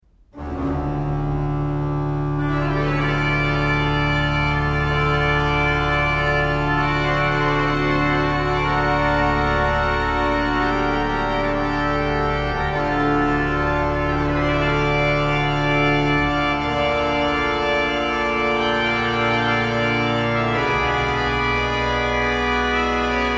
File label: Grand Jeux, pipe organs; Marchand